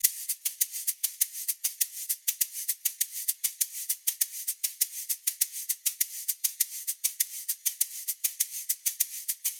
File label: hat loop 100, Drums; Pop; Hat Loops; Slate; SD PASADENA Pop Sample Pack